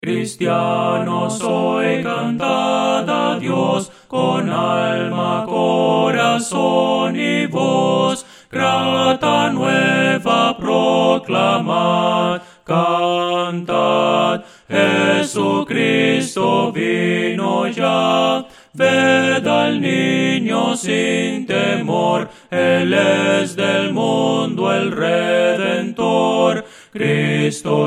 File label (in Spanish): voces